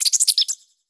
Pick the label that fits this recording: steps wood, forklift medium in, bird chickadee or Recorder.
bird chickadee